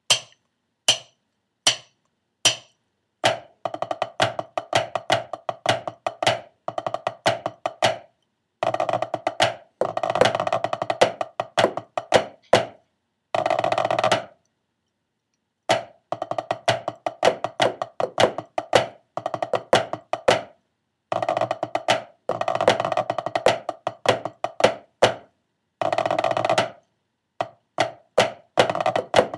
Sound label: snare tenor